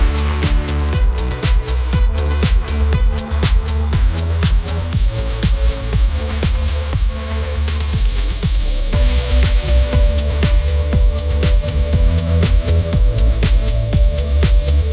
bright synth pop groove, ringtones

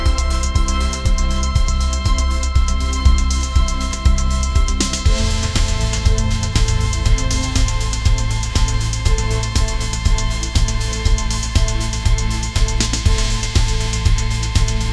epic pop symphony rising; ai-generated

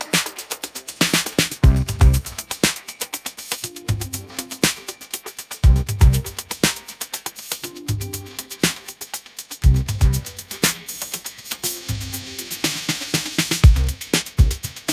bright synth pop anthem, ringtones

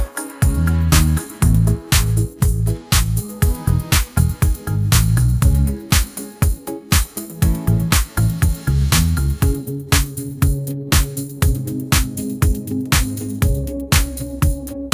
pop anthem; ai-generated

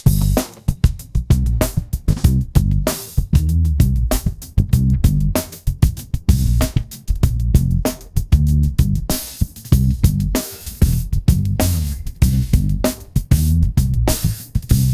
funky bass line, ringtones

